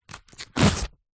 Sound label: foley notepad small binded pages several rip tear out; zapsplat